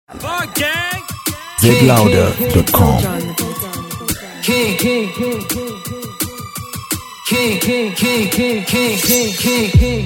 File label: Free Challenge Instrumental